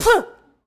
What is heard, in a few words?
Boxing Female Spit Mouthguard